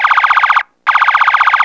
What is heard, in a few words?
siren